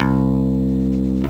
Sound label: Basses Root C; Vengeance Samples